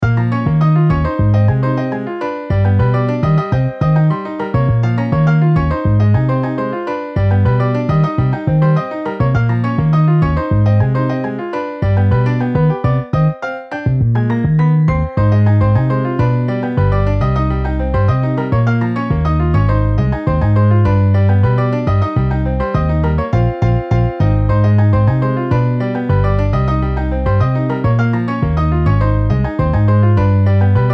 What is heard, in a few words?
Piano Bass MIDI; Timbalive